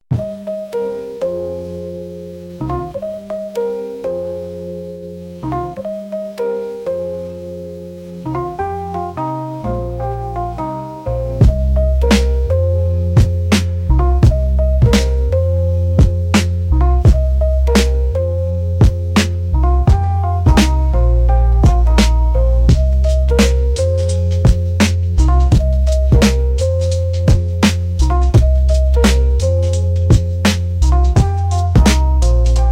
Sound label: groove machine